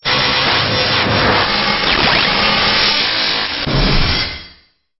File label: Stinger; STINGERS